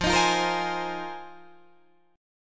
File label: F Minor 11th, Guitar DADGAD